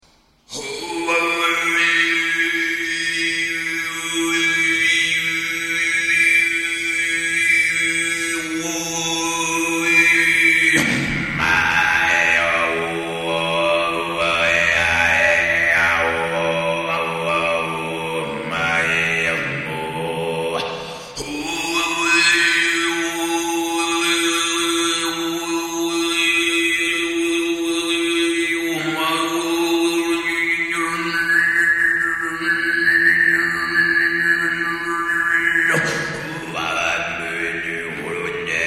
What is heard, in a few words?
adlib, khoomei